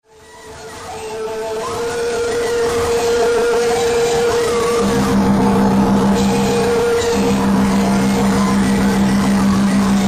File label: Noise Band Live Noise Fest; Tampa